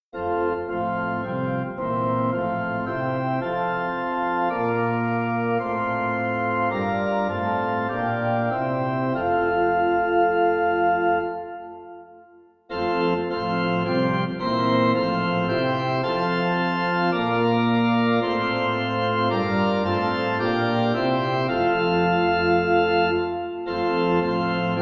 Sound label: Organ